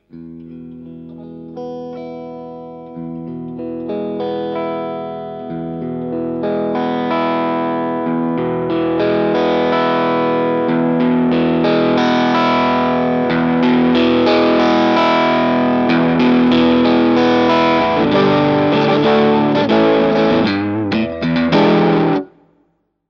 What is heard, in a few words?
strat neck + middle